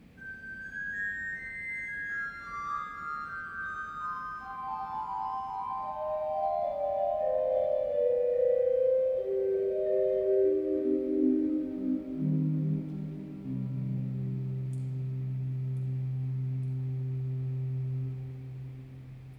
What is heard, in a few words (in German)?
blockfloete 4, Blockflöte 4'; kirchenmusik; pfeifen; gereon koeln